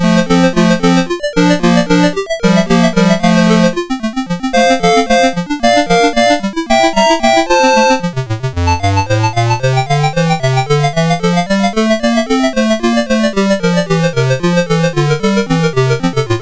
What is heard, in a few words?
Prelude in F Major, MOS6581R4; Big T Cat